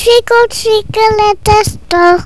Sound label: twinkle